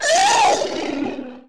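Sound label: scream; The Elder Scrolls III - Morrowind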